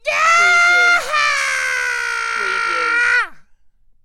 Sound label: Rebel Yell